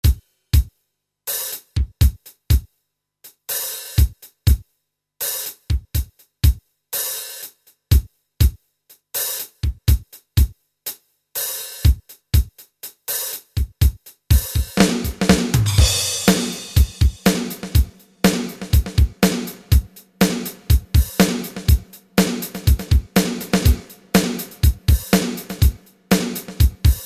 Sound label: Pop 8 beat